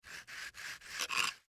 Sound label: MedicalScientific; Soundfx III